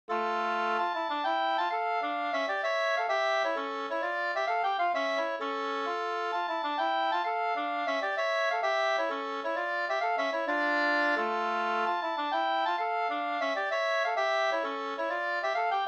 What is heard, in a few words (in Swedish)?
polska springlek